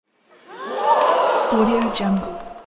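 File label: surprised crowd reaction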